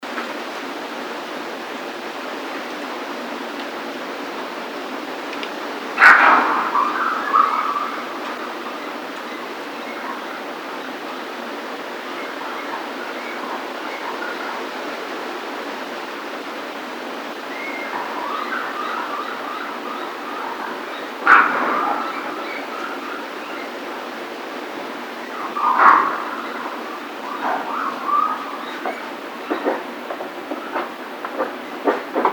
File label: Raccoon; Northern Raccoon (Procyon lotor)